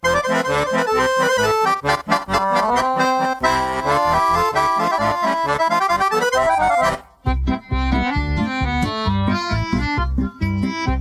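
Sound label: backing track; vokalnyi ansambl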